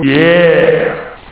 "ah"

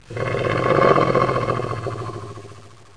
Growl from cave

